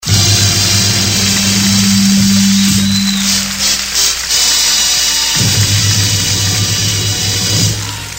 fanfarria; venevision

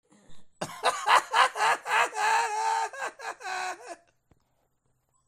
risa burlona

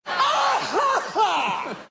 risa; jim carrey

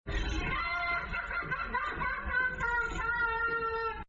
risa; joker joaquin

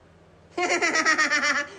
risa; annoying orange

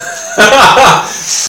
risa

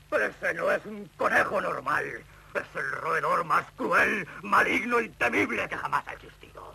jajaja

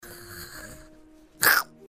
ataque michi

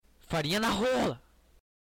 grito

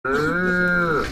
grito sordo

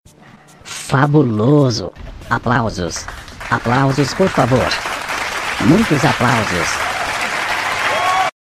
aplausos

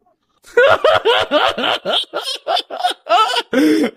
risa

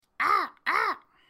cuervos

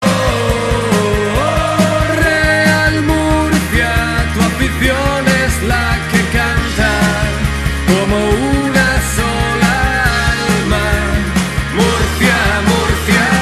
murcia himno

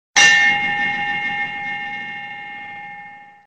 sonido de golpe campana; el chavo

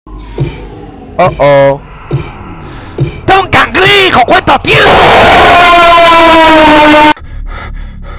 rap

